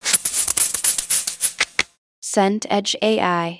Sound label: sandpaper wood